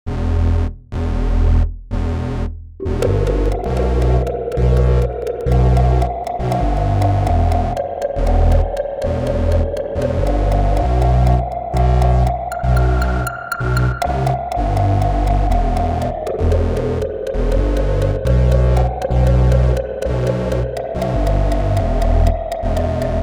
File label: buchla test; arturia; v collection 6